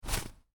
Footstep snow boot, Boots: Individual Footstep On Snow, Heavy; Human